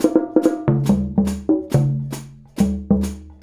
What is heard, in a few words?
Mallet Drum and Hi Hat